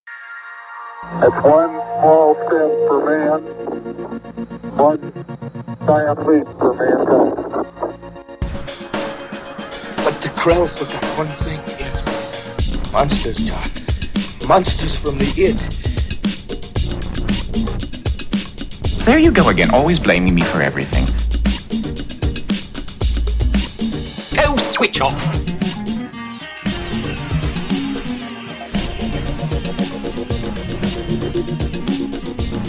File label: Intro Music